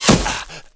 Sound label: hit; jb engine